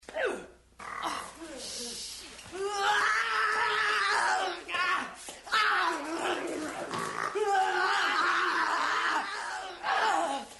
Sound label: grunting; manly grunting of the participants; fight sequence